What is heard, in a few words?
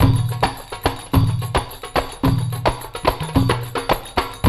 TURKISH 109, percus loops